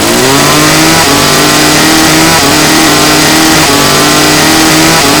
chainsaw fire; weapons

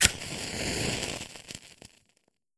cannon match; weapons

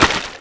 healer shoot; weapons

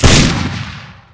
extra shoot, weapons

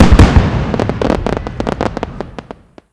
s blast, weapons

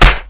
brico hit, weapons

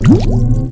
mlay heal, weapons

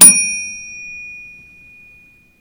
fireworks reload bell; weapons

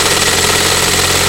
chainsaw rev; weapons

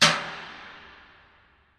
bucket on; weapons

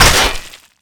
grappling hook impact; weapons

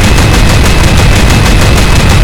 sewing fire loop; weapons

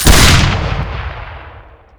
scatter gun double shoot; weapons

